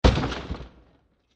Heavy Impact With Various Elements; Bursts; Impacts; Crafted Signals

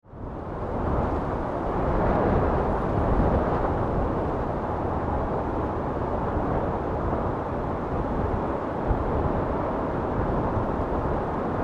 Wind Storm Constant, Weather; Wind Sound Effects; BlastWave

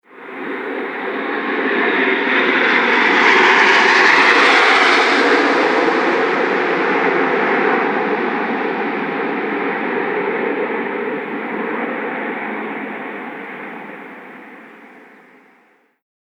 Formation Fly Over, formation flying aircraft; Red Arrows; Airplane Sound Effects; The Real Thing!

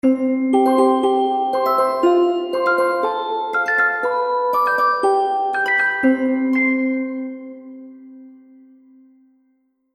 Happy Baby Game Music; Cartoon Music Sound Effects

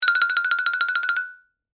Xylophone Trill; Mallet Sound Effects; Musical; SoundEffects-BlastWave

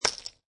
Poker Chips Dropping On Poker Chips; Poker, gambling, chip; clicking

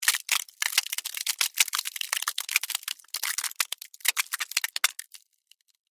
Ice Crack Long, Scifi; Science, Fiction

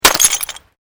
GLASS SHARD SMASH

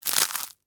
Texture, Crunch, Oyster, Crackers; Crunches (Sound Effects:Objects)

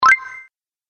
Positive Win Mini Bonus, Video Game Sound Effects

